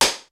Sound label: kicks snare, kid; Kid Nepro; Elite; KICKS SNARES